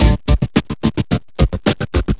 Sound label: D-Kit